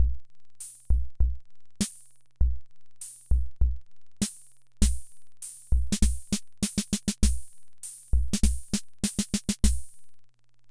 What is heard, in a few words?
Hammond Auto-Vari 64 Loop, drum machine; Hammond Auto-Vari 64 Ableto Project; 300 Drum Machines